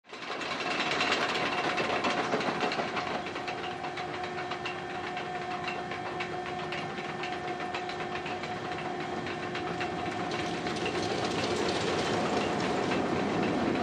Roller Coaster

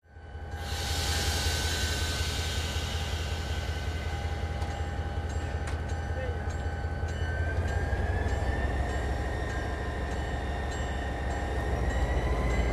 Train Station Amb

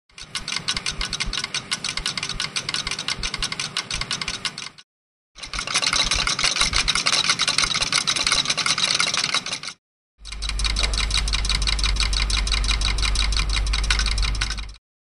Lights Clickings, buzz

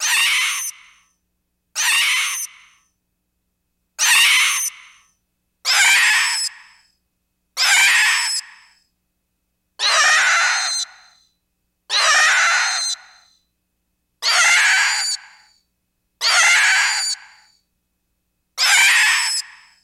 Zombie Cries Various; zombies

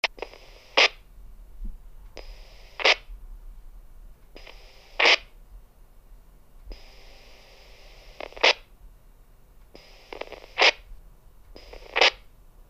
Static; Walkie-talkie

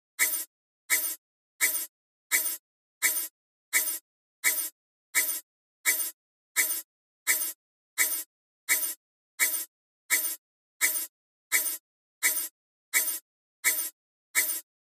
Alarm Strobe Light

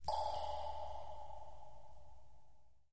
Echoey Cave Drips Wa

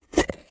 plastic lid open

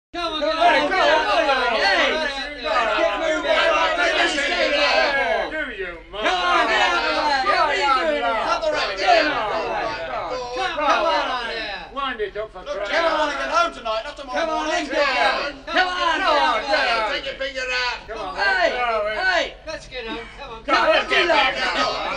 Group Men Shouting

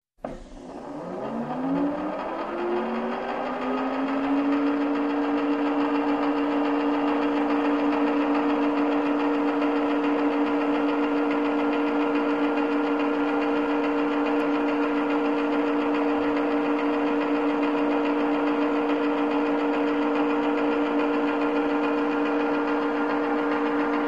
Motor Turn On Wind Up

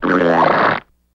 Growl Comedic Squeeg, squeaks

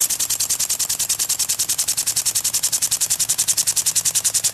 Sprinkler Normal Rainbird Kick Back Loop

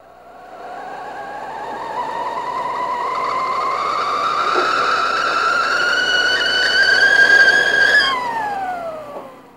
Kettle Shorter Chop, cooking & food

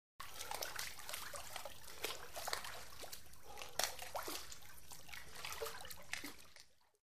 Swimming Breathing

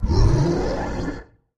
CREATURE GROWL

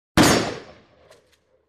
Shotgun Ga Fire Ri; 12 Ga

